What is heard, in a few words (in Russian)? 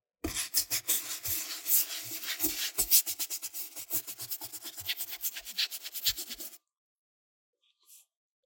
korotkij klip s shetkoj dlya chistki razdelochnoj doski